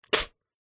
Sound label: human spit; zapsplat